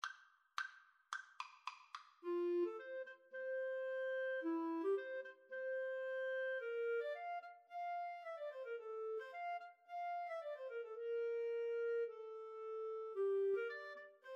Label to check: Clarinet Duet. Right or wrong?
Right